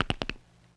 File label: Teeth Clacking; Vintage Cartoon; Foley